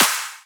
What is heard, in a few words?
clap snare; Anthemic